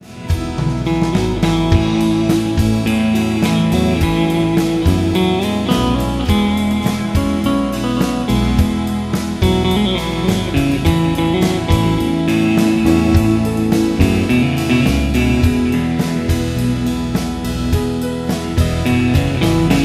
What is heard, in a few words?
Fender